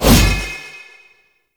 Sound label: Spear Gun Throw Fire; Hoplite